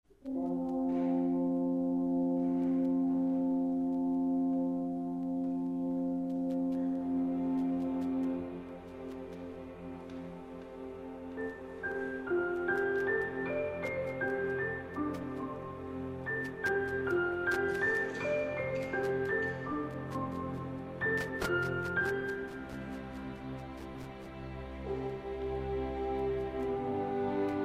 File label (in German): Krakowiak F Dur; Fryderyk Chopin (1810-1849); Krakowiak F-Dur Grand Rondeau de Concert op. 14; Eröffnungskonzert 2005